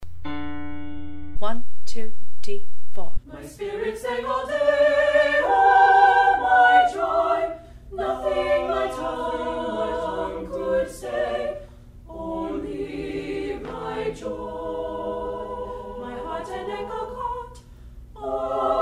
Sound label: My Spirit Sang All Day minus bass